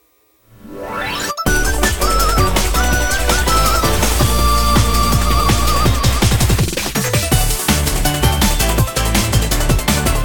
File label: Forest (Alt); chikis chase